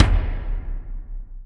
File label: power tom; Greytsounds; Scott Peers Sound Engineering